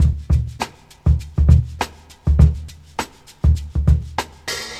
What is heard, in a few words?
100bpm, breakcore, breaks